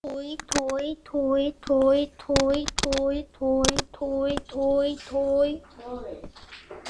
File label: toy